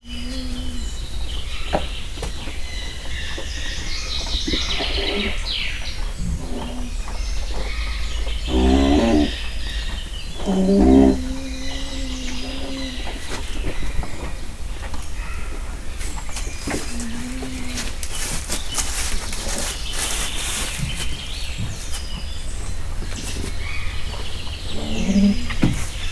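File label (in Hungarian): halkan nyöszörög pata szája, sisakos kazuár; állathangok; miskolci zoo 2009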